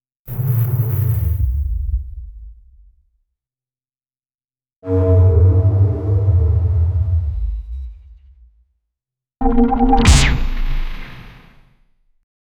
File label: epic cinematic synth with, distant explosion